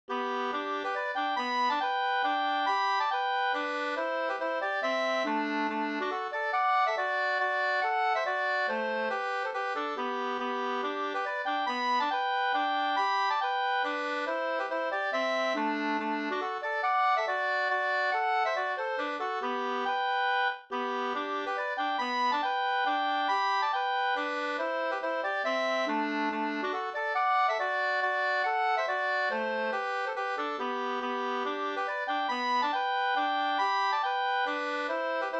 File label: mazurka; Flickan med polkaharet 2st